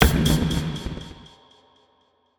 impact mid echo club F

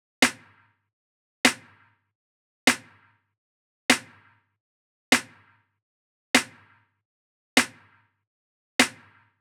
Snare

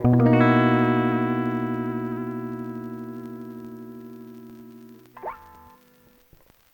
chords, BMaj7

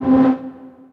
Synth Short Hit

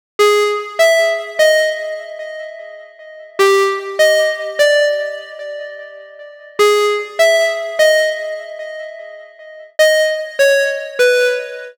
Synth Loop, 150BPM, G-

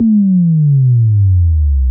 Sub Drop 125

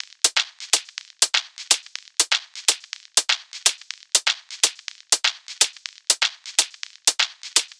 PERC LOOP 123 BPM

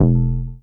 Bass

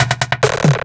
Glitched Shot

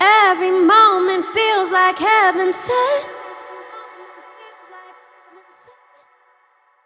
vocal phrase short wet 92 Amaj; scent

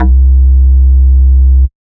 Bass C Digital Saw

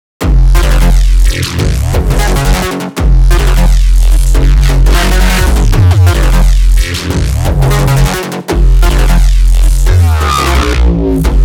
Song Kit F Minor

